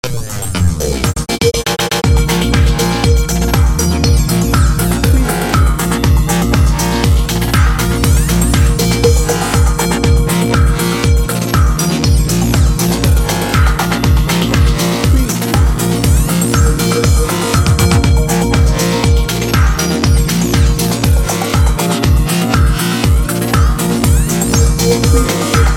grungy edm bed

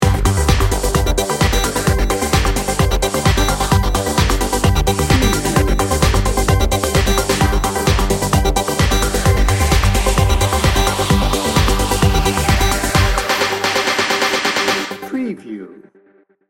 technology driver

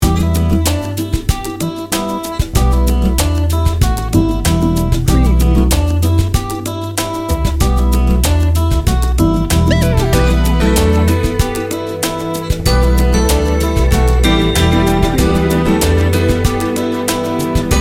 trance lucent

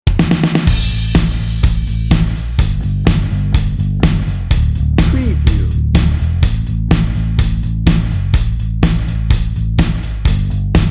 texas rock dnb

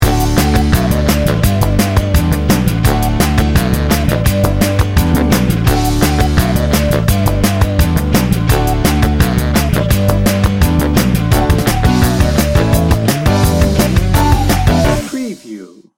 cartoon critters

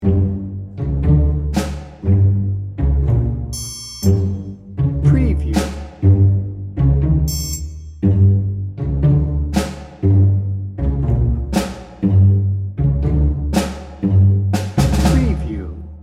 cartoon adventures loop

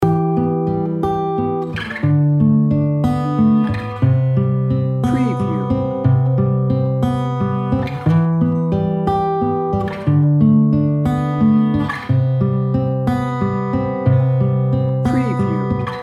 warm and tender loop

